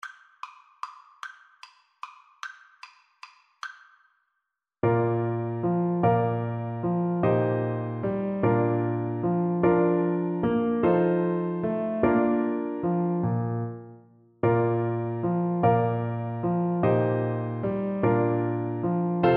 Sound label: tit willow, Trombone